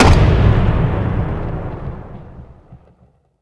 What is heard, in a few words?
Thunder Clap Caster; warcraft3